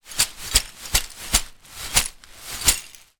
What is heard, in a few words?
glass broken in plastic bag shake x6; zapsplat